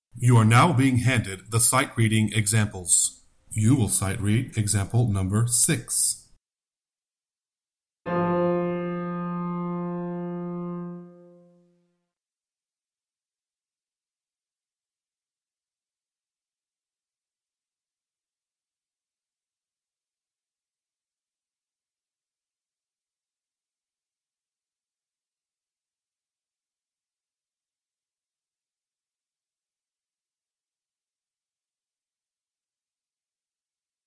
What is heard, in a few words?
Sightreading Example Tenor